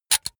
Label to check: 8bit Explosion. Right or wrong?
Wrong